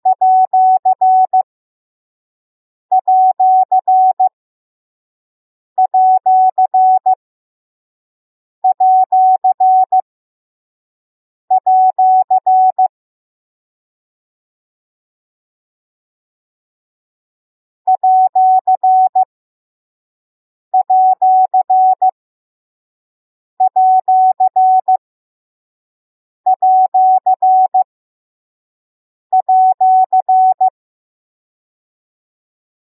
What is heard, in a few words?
Numbers, morse code